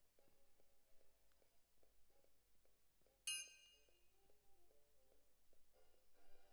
Perc Close

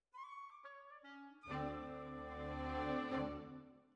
Violas, jazz for piano

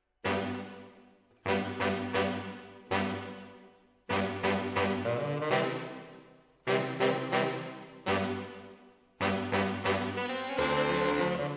MKH AB, piano

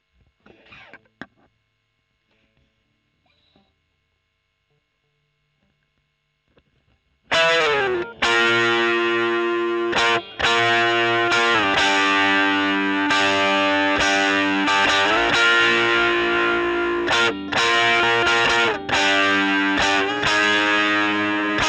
E.GTR Gefell OD

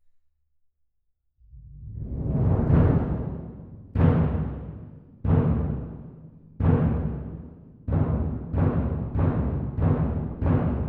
Twin R Perc

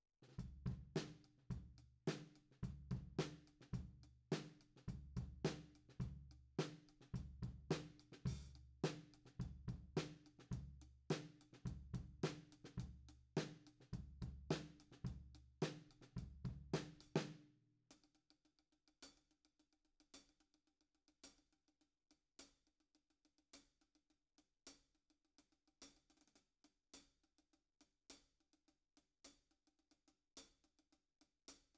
Floor

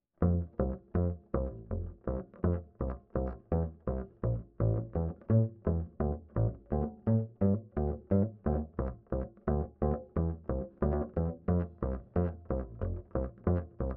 Bass Line

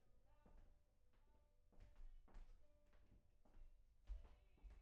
Piano C

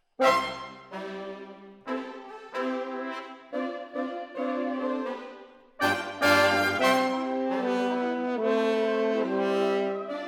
Horns Over

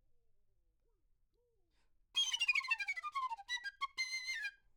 Flute

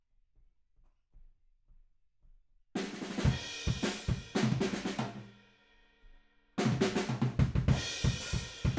Room

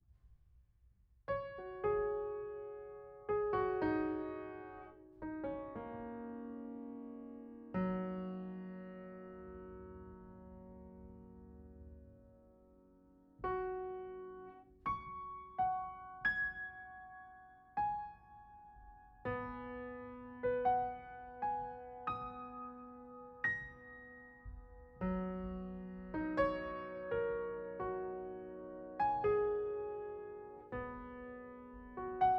Piano Mono

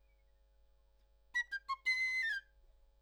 Flute

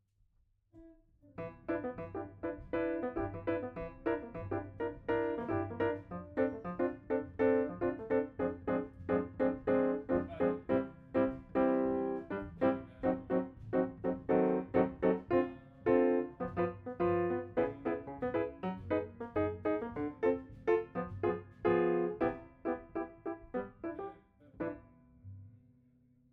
Piano B

